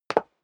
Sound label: step high heels wood parquet single stair up; schritte